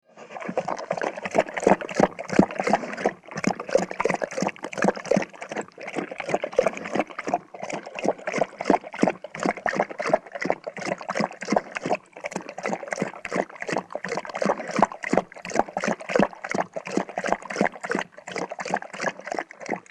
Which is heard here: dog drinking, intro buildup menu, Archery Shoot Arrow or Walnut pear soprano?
dog drinking